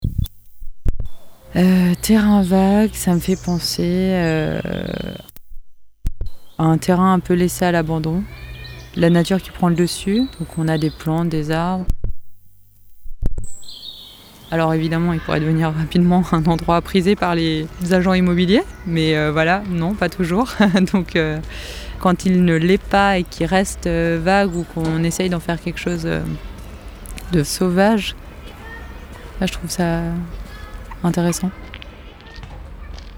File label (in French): Terrain vague